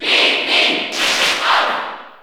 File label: Cheer Italian; Alph; SSBU